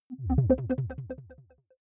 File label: button echo wobble arpeggio; signale